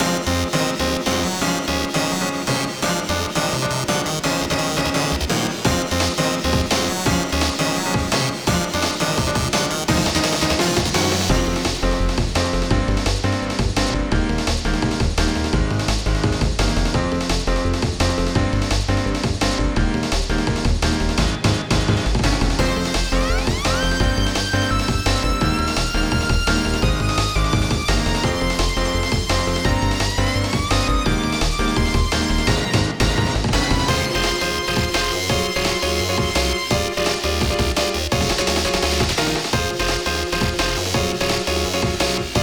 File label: Freeze loop, drum and bass; 禁域の番人的なキャラをイメージした曲です。怪しげな雰囲気を織り交ぜつつ全体的に美しく作りました。